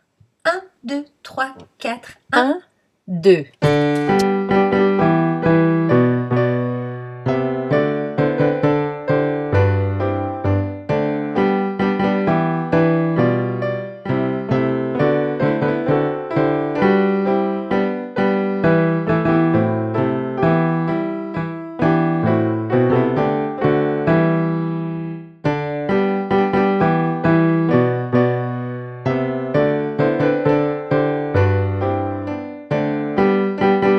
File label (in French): basses